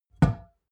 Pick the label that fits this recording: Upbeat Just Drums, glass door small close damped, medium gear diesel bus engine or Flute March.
glass door small close damped